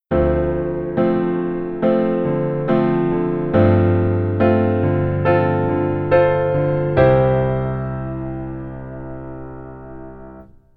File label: keyboard top note